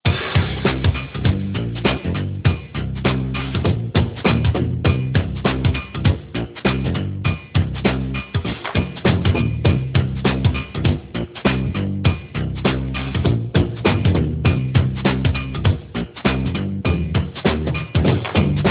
funk, instrumental

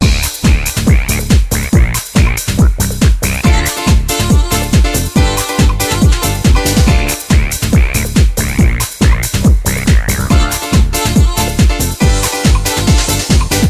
ringtone dance, instrumental

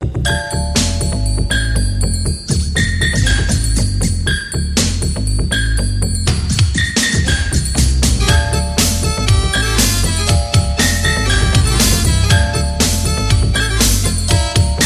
russian techno harmonica

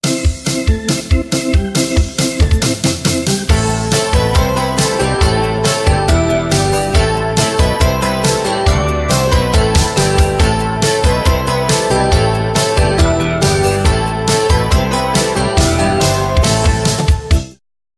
ringtone pop rock

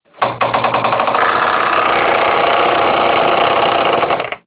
ringtone door open

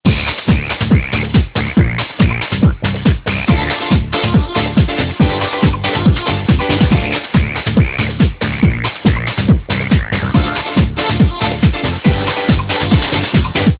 ringtone dance, instrumental

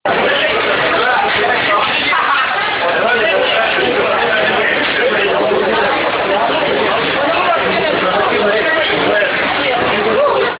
ringtone people meeting

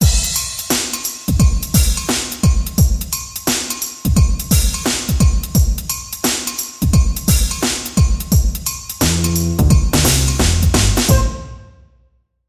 ringtone pop shuffle drum

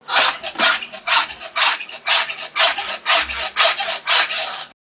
sexy bed

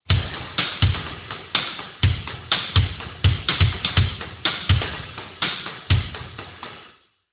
jazz drum; drumbeat

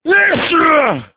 male sneeze; human

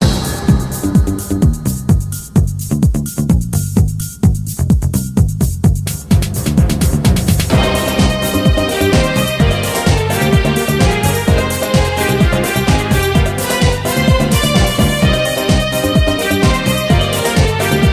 ringtone house

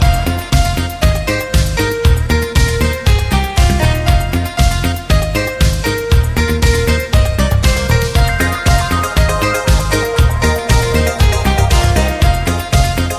ringtone disco, instrumental

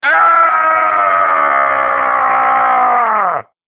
male scream; human

